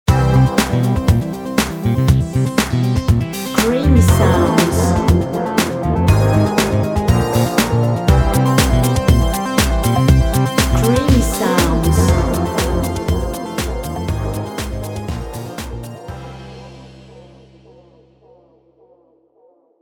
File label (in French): creation musicale electro fun